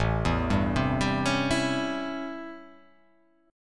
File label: A 7th Sharp 9th; A7#9; arpeggiated; JGuitar